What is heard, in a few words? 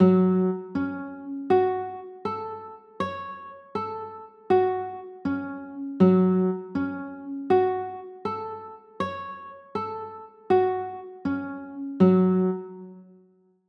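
G flat m9 chord, guitar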